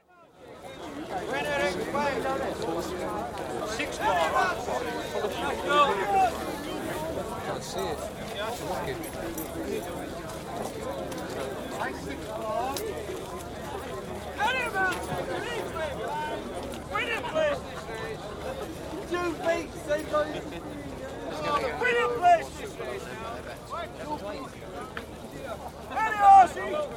National Hunt Racing, busy bookmakers with audible horses names, distant PA & distinct speech; Miscellaneous Sport; BBC